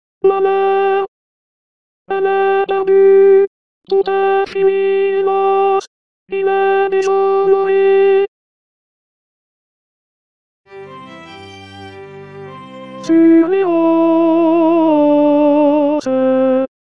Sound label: Tenor; opera triste